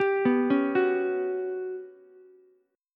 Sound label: G Major 7th Flat 5th, Ukulele; GM7b5 arpeggiated; 12,11,9,9